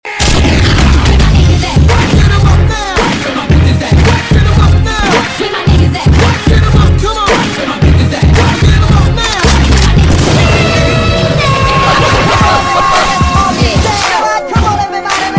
Remix Hiphop

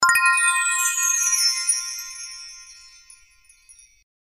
chimes glassy

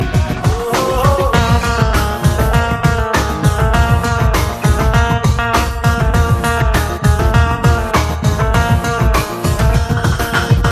Awesome Music

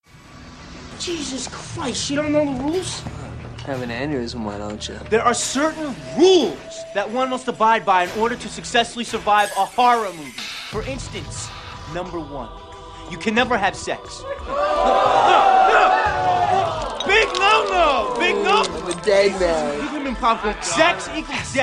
Scream

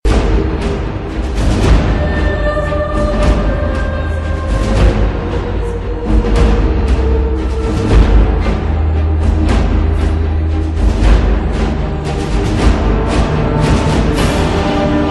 ringtone; skyrim

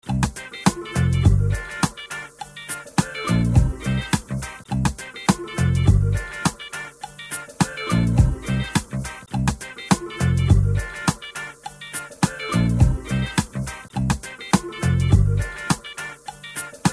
game start; mario64

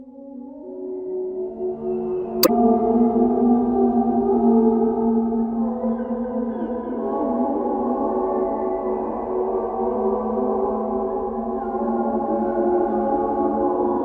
ghost moan; ringtones

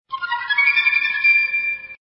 Flute Msg Tone

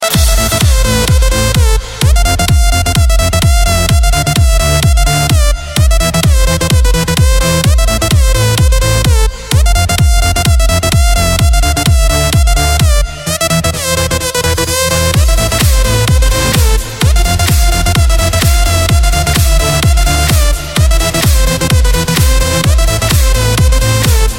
Electrance

